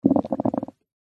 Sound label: nature water blowing air bubbles in bowl of water foam short burst